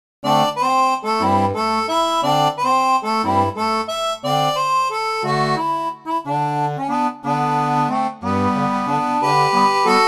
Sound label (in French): seraphone, orgue barbarie